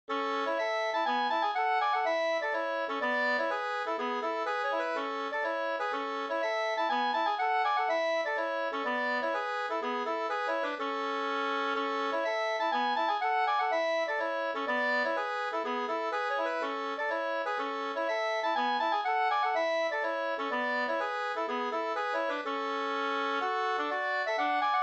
polska, 2st

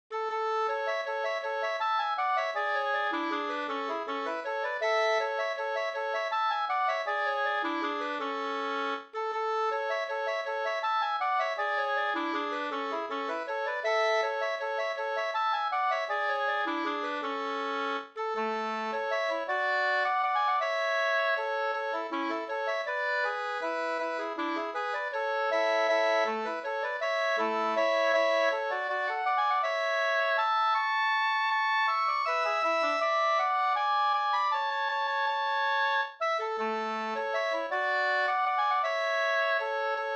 vals; Gas-Anders; Gammal Ljungqvistarvals

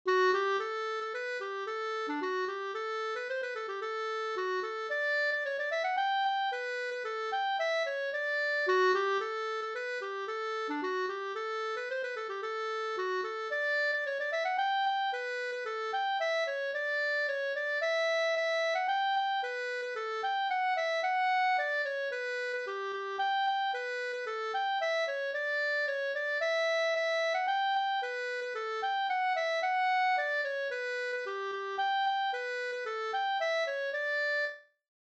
polka onestep; Jeppo-smetton fi

